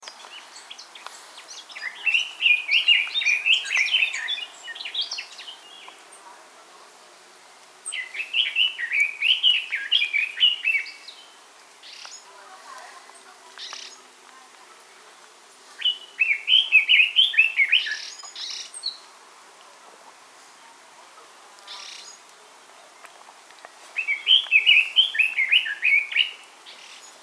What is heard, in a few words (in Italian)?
Usignolo del Giappone, colli euganei